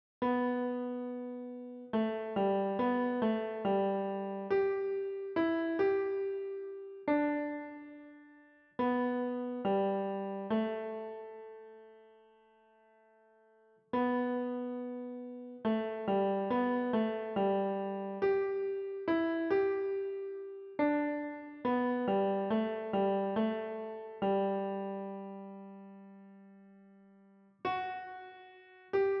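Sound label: piano, für Klavier, sehr leicht; amerikanisches Lied für Klavier, sehr leicht; Swanee River - für Klavier, sehr leicht mit Aufnahme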